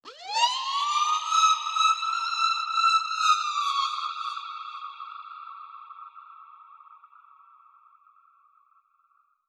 Verb Proc C, alarm siren, 125bpm; musicradar

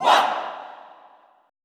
ALL OOAH, Classical Choir, SHOUTS; Best Service; Extended Classical Choir

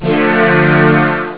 d50 short string; steel; Amiga Soundtracker Sample Packs

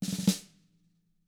ROLL, SNARE ROLLS; ILIO; Double Platinum Drums 2

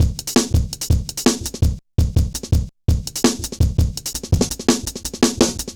Jazz Shuffle, Drum Loops

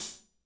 HAT L, WARM BALLAD; Spectrasonics; Burning Grooves CD2

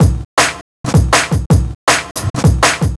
Rippin Beat, Drum N Bass; Sonic Foundry (Sony Creative Software); Knutrix Drum & Bass

